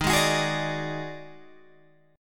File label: D sharp Minor Major 7th Double Flat 5th D sharp Guitar Open G